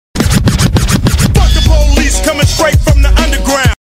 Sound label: police; svencoop; FZSaySound